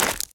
ice step; Golden Crayon Sounds

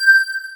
impulse; Business skieme Beta 1

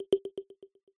experimental yes, iOS Sounds

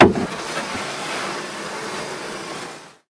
canoe; Golden Crayon Sounds

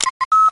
mine place; Original TK Sounds

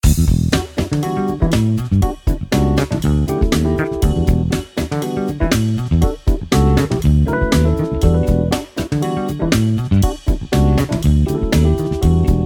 main music; RS Games Sounds; Scrabble

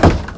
wall stand; Golden Crayon Sounds

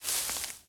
grass step; Golden Crayon Sounds

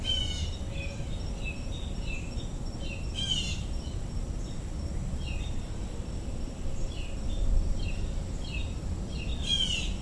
evening birds; Golden Crayon Sounds

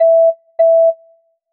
Drop In Ongoing Tone; iOS 26 Sounds

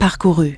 completed; iOS 4

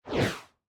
move dist; Original TK Sounds

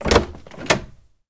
door open; Golden Crayon Sounds

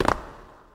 cave grounds land; Golden Crayon Sounds

